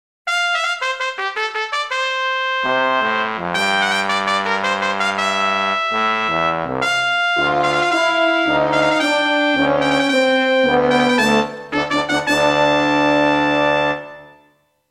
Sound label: fanfale